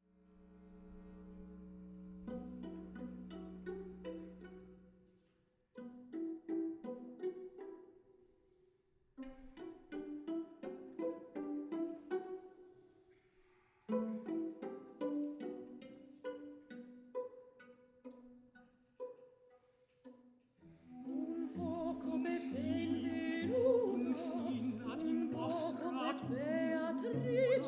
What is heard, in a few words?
pulses duet live